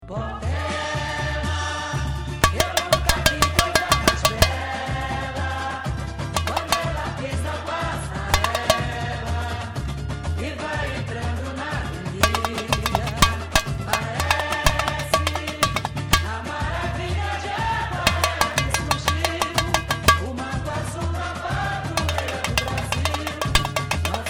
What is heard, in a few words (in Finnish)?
bateria